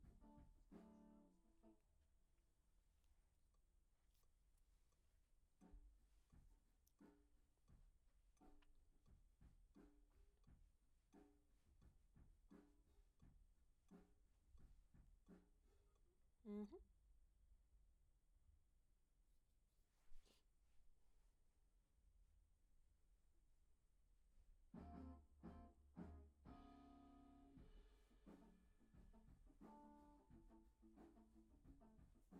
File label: Act Guit L